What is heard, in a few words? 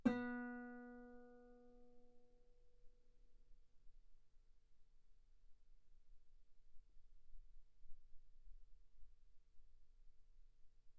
Guitar B3